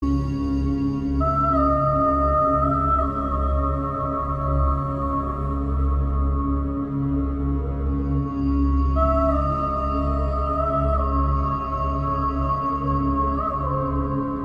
ringtone; frozen

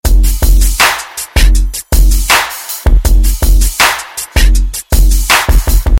synth hop ringtone

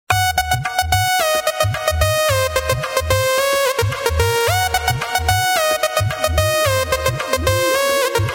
SMS Ringtones

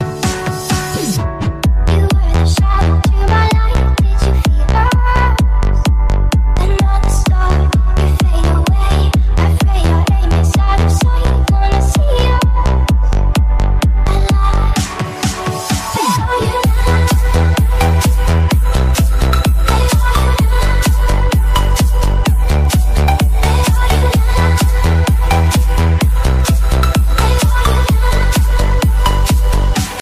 shuffle dance

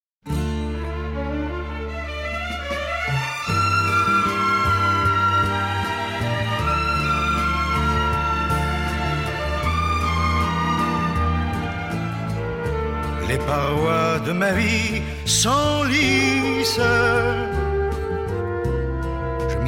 ringtone clasic

